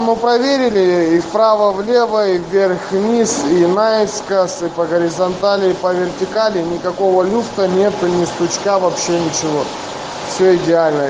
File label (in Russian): проверка крышки